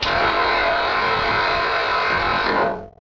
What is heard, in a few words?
WINGS